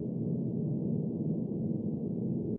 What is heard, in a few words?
rumble; Original TK Sounds